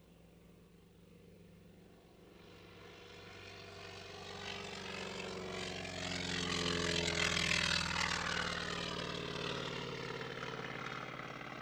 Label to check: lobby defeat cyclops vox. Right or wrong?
Wrong